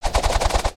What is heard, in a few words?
Frisbee Spinning; cinematics